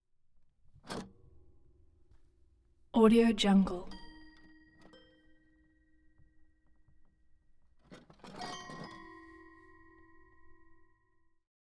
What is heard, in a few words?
toy piano multiple keys ascending; prosoundfx